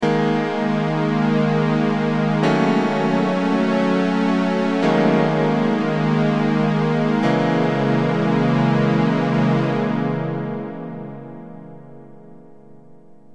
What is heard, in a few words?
m1000 vesta stereo phase